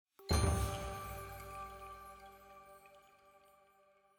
Jingle Bellchime Perc G

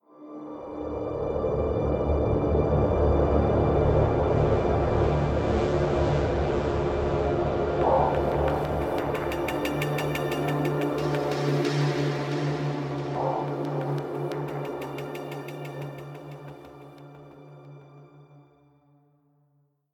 Stinger Long

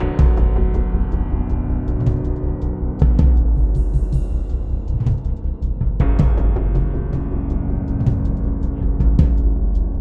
Cave (wither); minecraft story mode gamerip 2016; A Block and a Hard Place

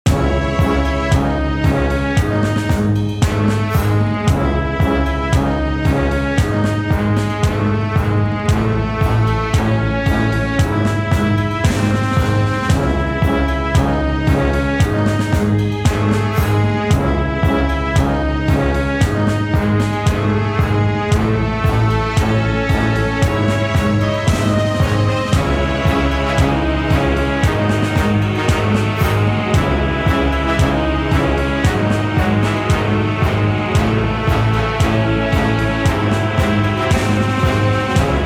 Menu Theme Only Music; Sex With Stalin

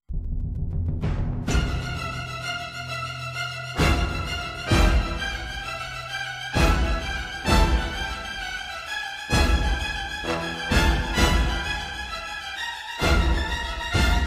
Desperate Battle; Star Trek Klingon Academy; windows

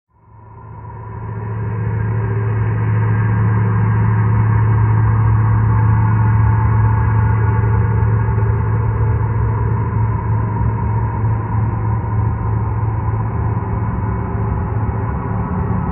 Creepy Haunted Drone Loop

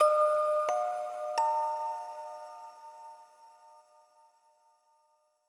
Retry Jingle; Friday Night Funkin' vs. Corrupted Bill Cipher 2022

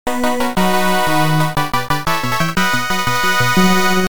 Round Clear

